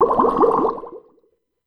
bubble short

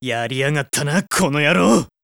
voice damage

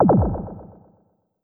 buzzer

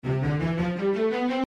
C major

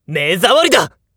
attack, voice; jakigan

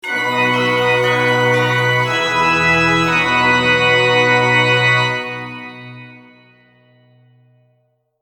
jingle organ

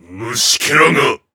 monster attack

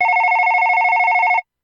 telephone loop

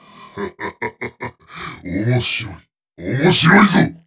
voice monster

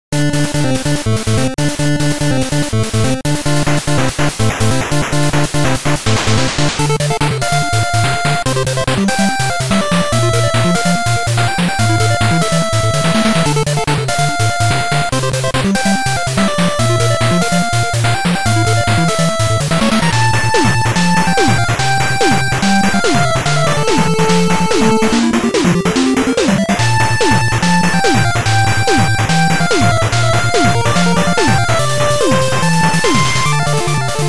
8bit stage

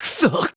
voice damage

